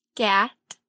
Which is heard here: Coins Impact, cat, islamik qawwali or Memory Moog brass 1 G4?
cat